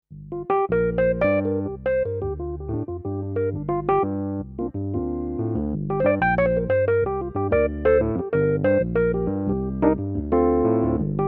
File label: Kurzweil SP7 Vintage EP